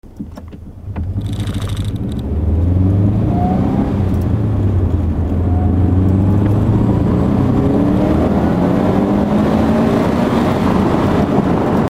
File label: GC8D SuperTi Hybrid; fujitubo